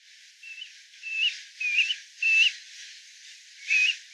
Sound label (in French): pluvier grand gravelot